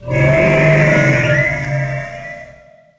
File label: dreadshark hit; DAoC; Toa; Gamestar